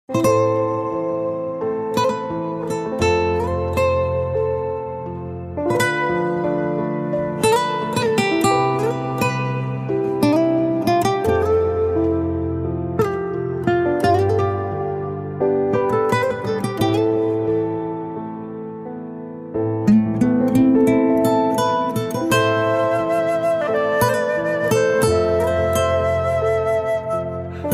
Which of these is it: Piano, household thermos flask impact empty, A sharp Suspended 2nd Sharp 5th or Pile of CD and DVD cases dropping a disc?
Piano